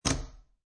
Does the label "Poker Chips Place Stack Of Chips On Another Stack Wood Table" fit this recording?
Yes